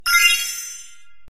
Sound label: Gacha Reward